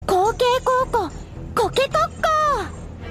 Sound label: ringtone; granblue makira